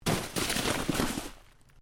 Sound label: Body Movement Snow Drop; Body movement foley to simulate falls and deaths